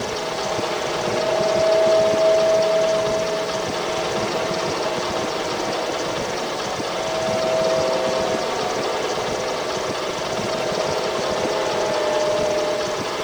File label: Speed Up Loop